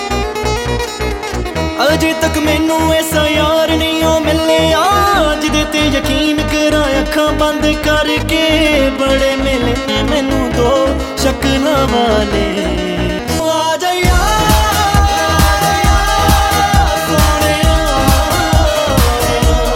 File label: Punjabi Song Ringtone